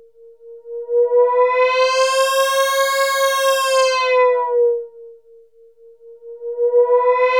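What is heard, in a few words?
mosquito, analog synth; AKAI S-Series CD-ROM Sound Library VOL-6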